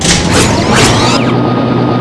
harpoon; quake2

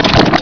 switch; quake2

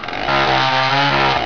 saw hit; quake2; weapons

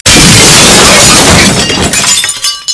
glass; quake2

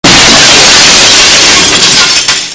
glass; quake2

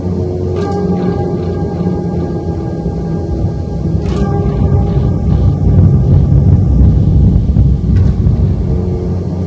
freight; quake2

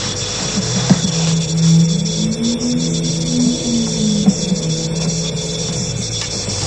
hum; quake2

